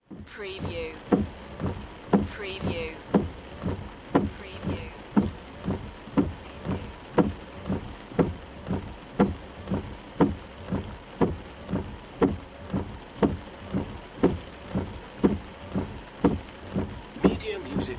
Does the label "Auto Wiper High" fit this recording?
Yes